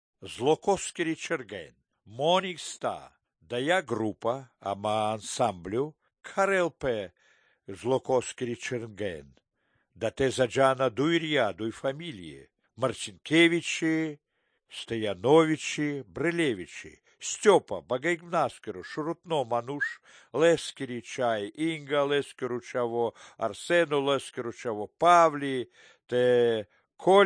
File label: romani christian songs baltic romani; belarusytkone litvitko romano rakiribe